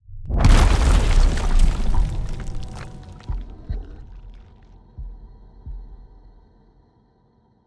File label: environment heart destruction